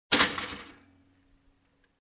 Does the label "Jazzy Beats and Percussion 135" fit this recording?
No